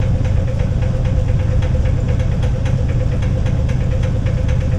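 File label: WASH MAC 100 BPM; MACHINES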